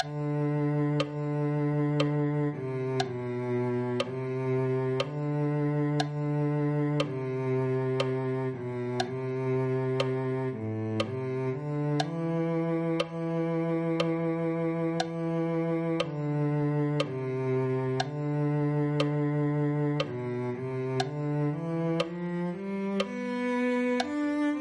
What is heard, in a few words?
cello